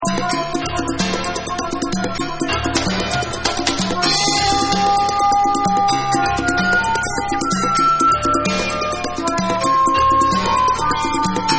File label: Synth apella